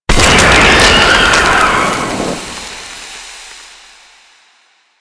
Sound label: Infested Moa Nanite Spawn; Warframe; Attacks